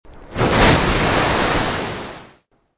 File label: mega Splatsh